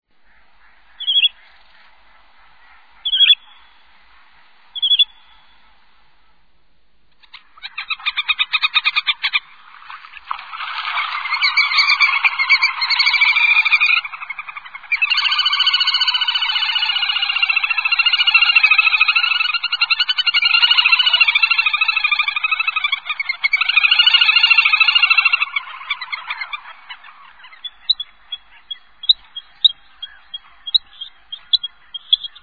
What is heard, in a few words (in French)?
grebe castagneux, chants et cris